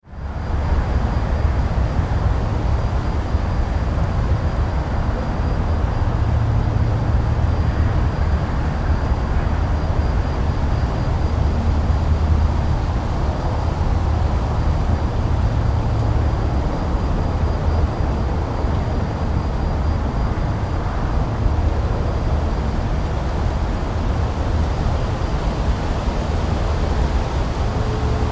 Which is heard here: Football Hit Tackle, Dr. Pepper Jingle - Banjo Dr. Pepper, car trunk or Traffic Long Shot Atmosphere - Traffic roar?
Traffic Long Shot Atmosphere - Traffic roar